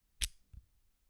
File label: Closing Belt